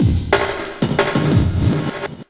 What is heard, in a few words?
184 clop reverb, drum loops and breaks